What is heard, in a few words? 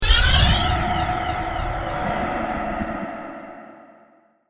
Solar Flare, science fiction sound effect; star wars / trek style games